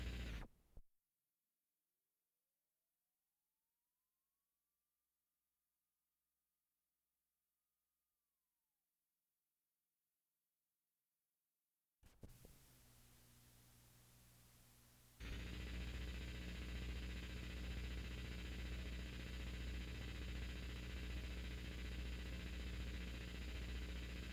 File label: farfisa II